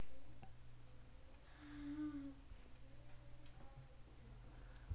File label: sea